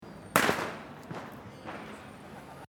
Silvester Knaller

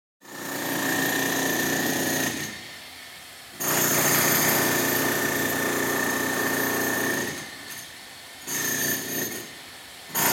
Schlaghammer

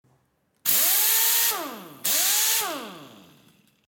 Druckluft Kompressor

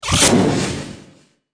schuss verfremdet

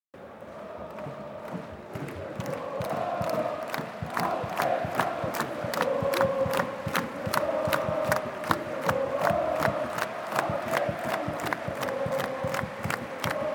Stadion Fan und klatschen

